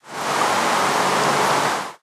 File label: rain, ambient weather